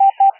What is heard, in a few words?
I morse code